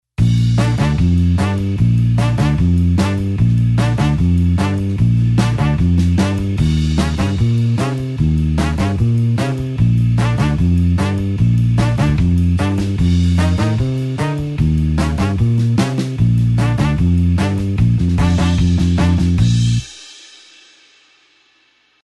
blues horns 8beat Bb tempo

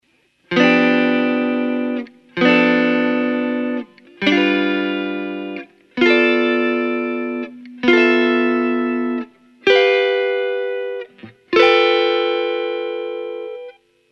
swing chord G blues voicing top

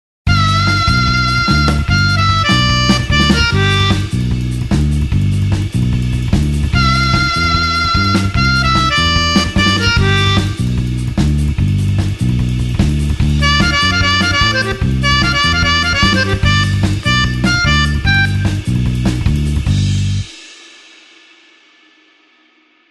blues harp 8beat G

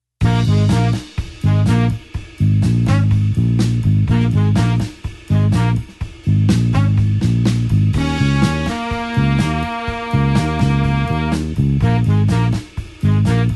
blues horns 8beat Bb

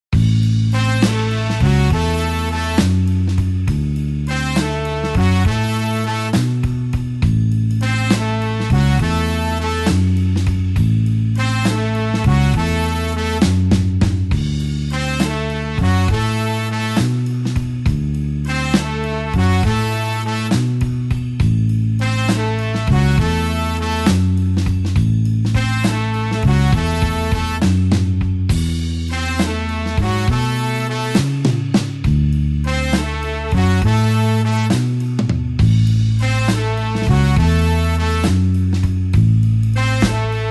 blues horns slow 6 A

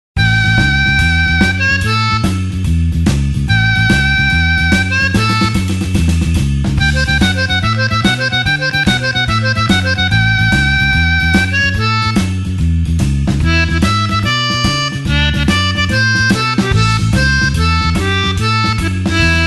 blues harp shuffle A

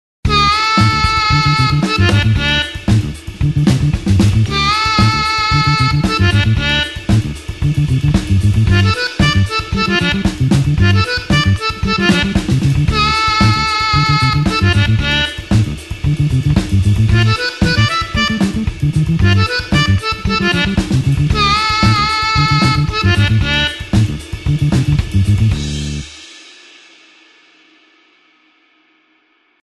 blues harp 8beat D

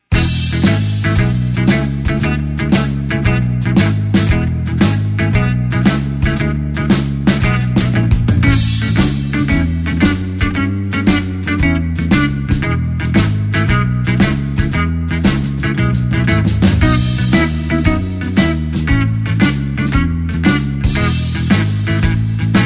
E shuffle chord cliche

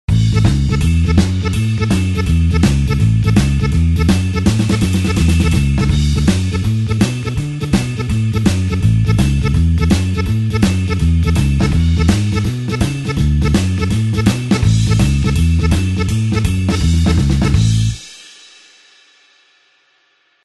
blues harp shuffle A

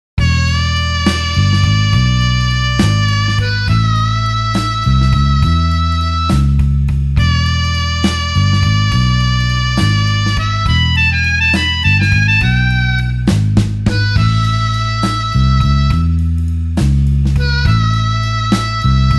blues harp slow E

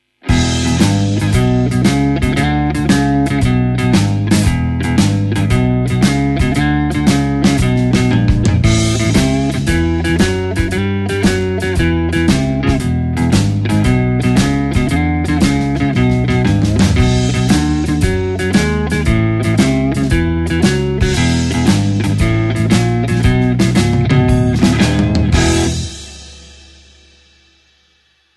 E shuffle bassline

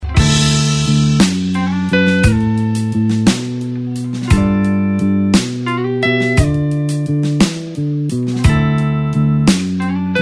blues slow backing slide fill

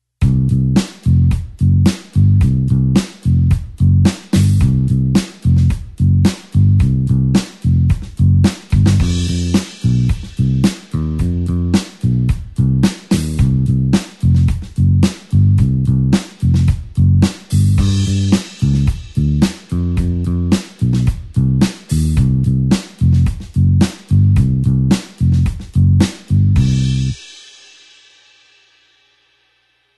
blues bassline 8beat C

